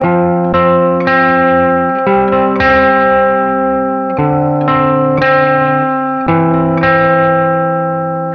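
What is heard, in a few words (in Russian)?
зацикленные мелодии для гитары loop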